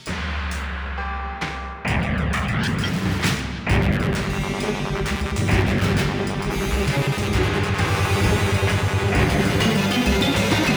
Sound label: Music Pirate Invasion